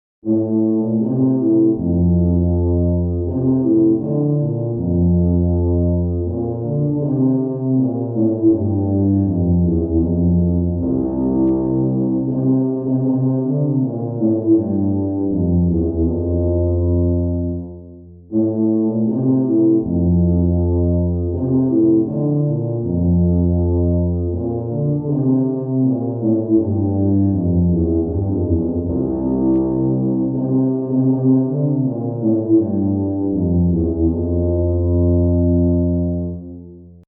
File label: tuba